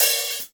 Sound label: open hi hat sample e key